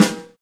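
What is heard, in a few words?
snare orchestra, Roland LA Composer; Roland - LA Composer vol. 1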